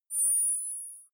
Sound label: zoom in long